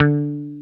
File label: BASS, D 4